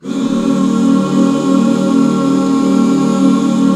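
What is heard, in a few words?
HAL Eb 7 left, Back Chords; Sound & Vision - Gigapack II CD 1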